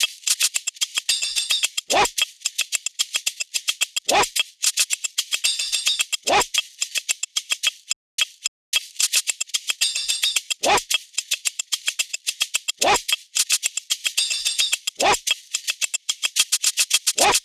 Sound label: perc 110; Drum Sample Pack; Loops; SD SEISMIC; Slate